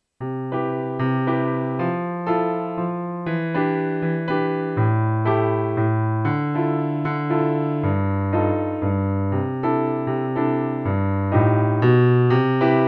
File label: Whispering Waltz, Piano; 1 page pieces book 2